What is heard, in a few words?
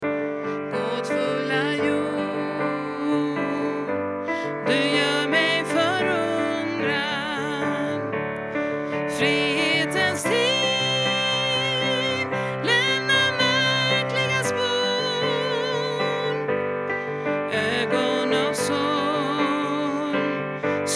tenor